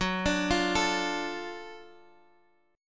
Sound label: F sharp Minor Major 7th Sharp 5th; chordshape; down